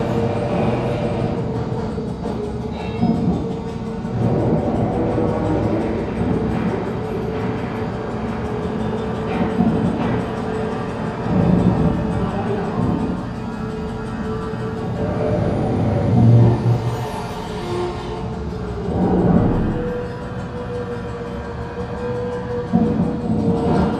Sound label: Assembly Under Ground Construction; Boomtown; Titanfall 2